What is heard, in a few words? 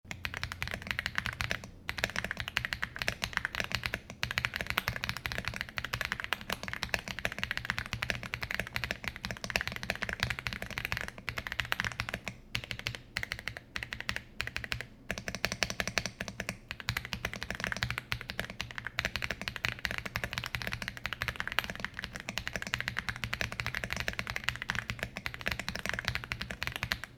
f65 sound test, keyboard; epomaker x aula f65 keyboard